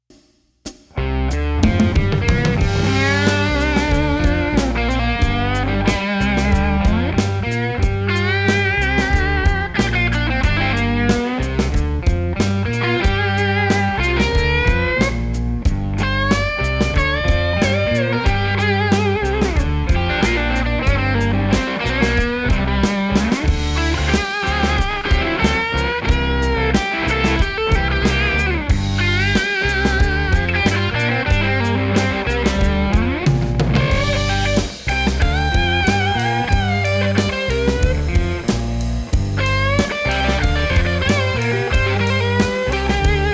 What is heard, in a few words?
Bad Sign Performance, blues slide solos